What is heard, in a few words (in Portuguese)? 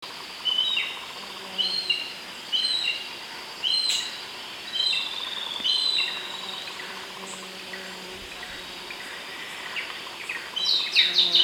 Gaviao pato